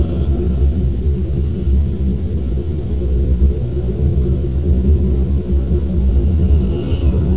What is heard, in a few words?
captain room, atmosphere